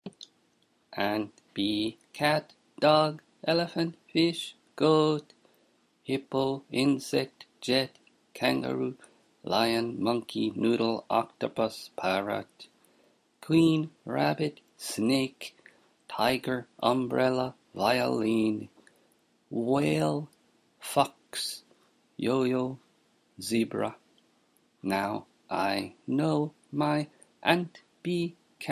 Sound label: Ant Bee Cat song